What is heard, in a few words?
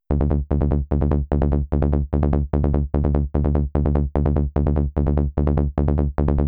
Energyball demonstration bass